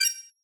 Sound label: beep; ufoai